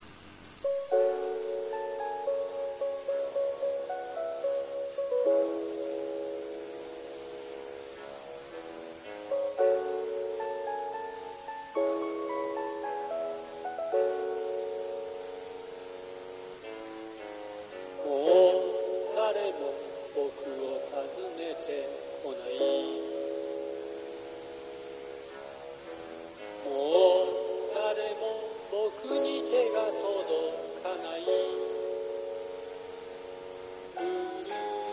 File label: taiko; 06 taiko